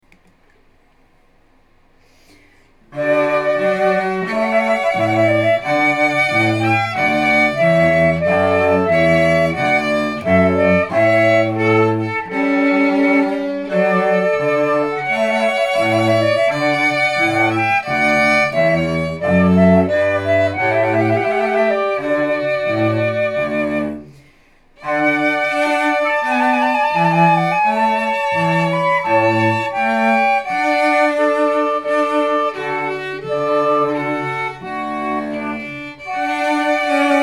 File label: Trumpet Voluntary